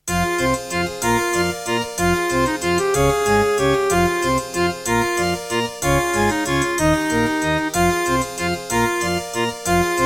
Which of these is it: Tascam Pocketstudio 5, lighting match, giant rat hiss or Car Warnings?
Tascam Pocketstudio 5